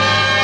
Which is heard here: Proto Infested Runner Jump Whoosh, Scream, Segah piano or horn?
horn